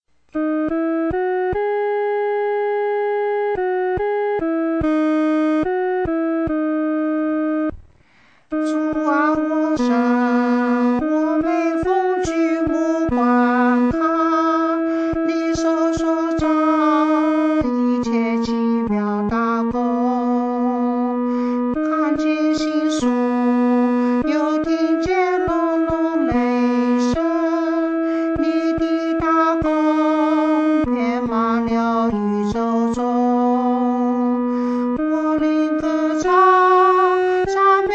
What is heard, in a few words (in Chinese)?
独唱 第二声; 袮真伟大